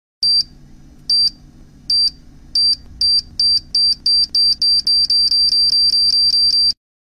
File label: Bomb timer sound effect; guns